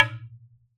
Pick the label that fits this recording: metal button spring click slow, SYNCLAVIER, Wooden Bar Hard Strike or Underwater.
Wooden Bar Hard Strike